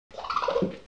Acinonyx jubatus gurgle; cheetah; The gurgling sounds when familiar males have met

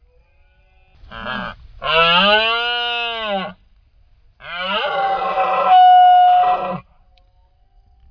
Cervus elaphus hispanicus coupling; A natural bout of three rutting calls of an Spanish red deer (C.e. hispanicus) stag with source-filter coupling in third call.

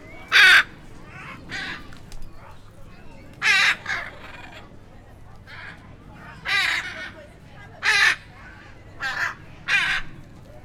Anodorhynchus hyacinthinus; Hyacinth macaw; A few birds from neighboring enclosures call to each other

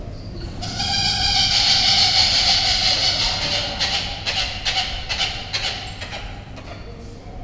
Jacana jacana; Loud call of a bird, flying over the enclosure

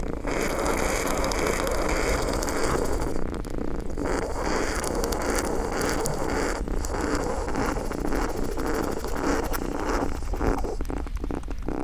Prometheomys schaposchnikovi threat; Threatening calls of a disturbed group of voles; a few individuals vocalize from a hide

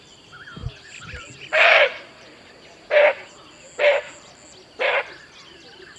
Muntiacus vaginalis alarm; Rapid sequence of oral alarm calls during freeing after detecting a researcher at close distance

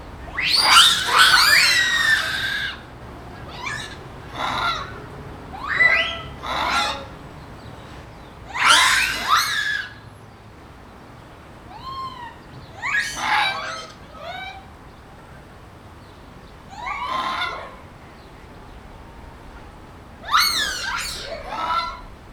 Macaca fuscata; Japanese macaque; The loud calls in a group of aroused animals

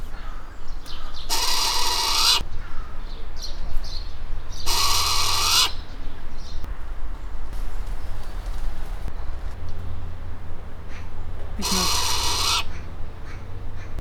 Asio flammeus noise; Short-eared owl; Hissing of a bird disturbed by its neighbor

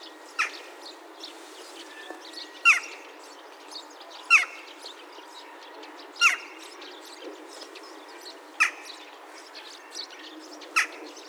Coloeus monedula wild; Jackdaw; Contact calls in the flock of jackdaws

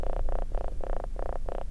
Prometheomys schaposchnikovi boom; Series of short low-frequency calls of an adult animal during the movement

